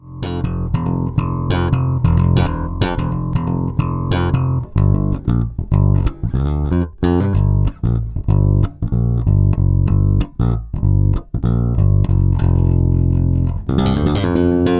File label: BASS NO Reverb; UA; Studio Elite